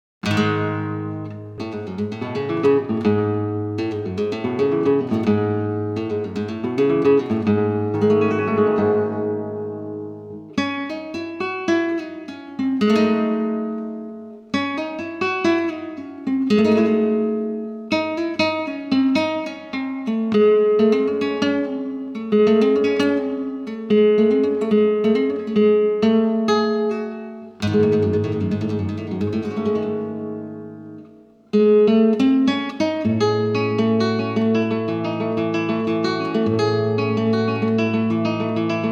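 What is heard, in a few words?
taranta, flamenco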